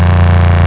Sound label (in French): MOTEUR; MEGARACE